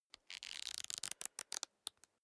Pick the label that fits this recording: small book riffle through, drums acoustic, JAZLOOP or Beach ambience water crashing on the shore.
small book riffle through